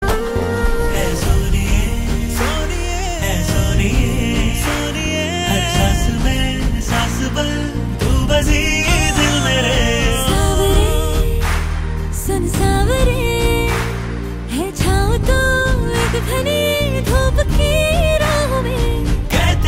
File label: Ringtone; Amaran